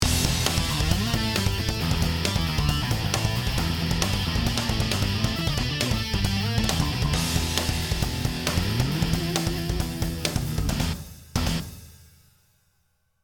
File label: sonic maximizer snare